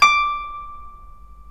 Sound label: GUCHIN D5; INIS - Opium